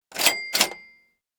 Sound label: cash